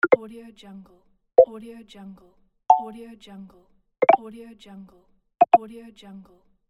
chat app pack; gamechestaudio

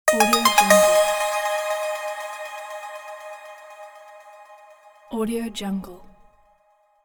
achievement level up

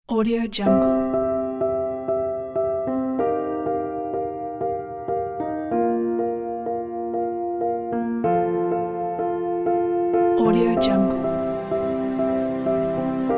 cinematic motivational

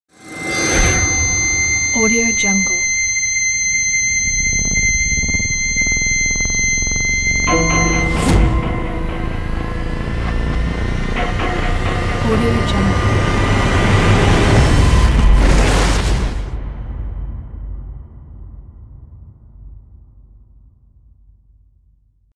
cinematic horror logo

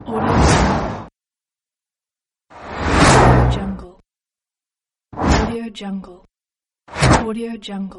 whoosh

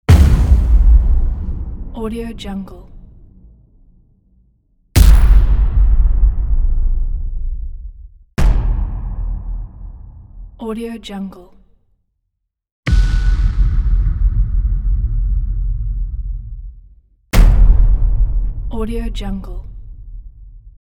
booms

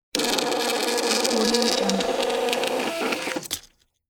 small label printer; applehillstudios

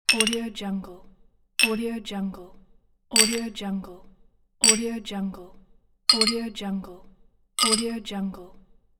glass clink

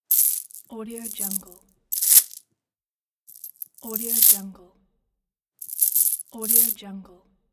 necklace chain

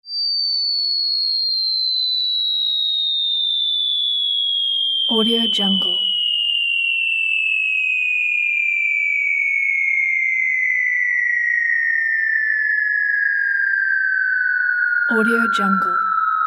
long falling

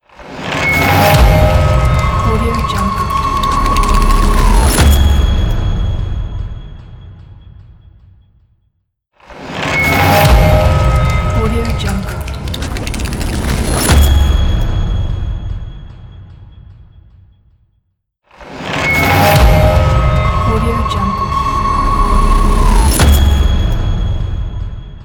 energy deformation logo

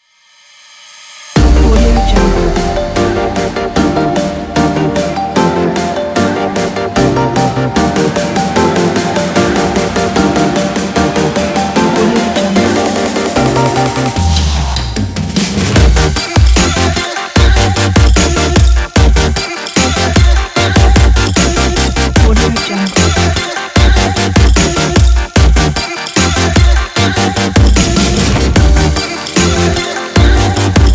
the abstract future bass

